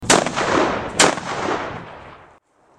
arquebuse

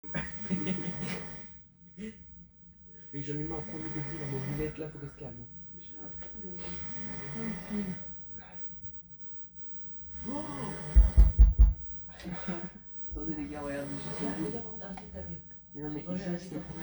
ronflement de tete

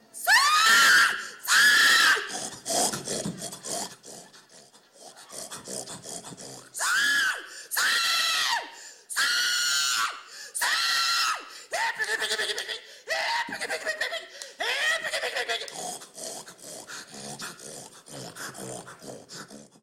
cri du porc

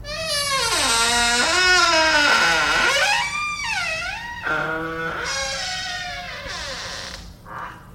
porte qui grince

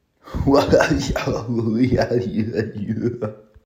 rire goofy

